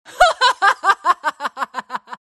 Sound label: laugh; dota; luna